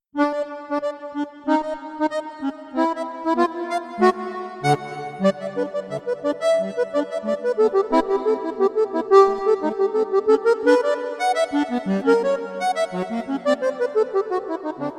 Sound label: Jumping Rag